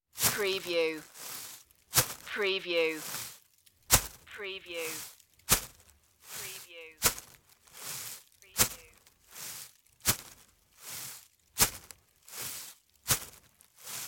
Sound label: walking on leaves